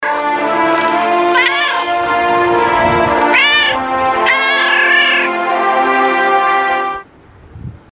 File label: victory